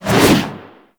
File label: Generic Spin Whoosh; Warframe; Characters